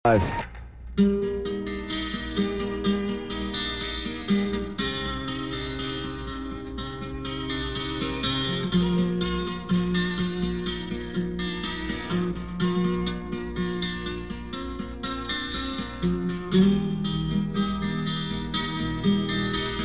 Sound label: guitar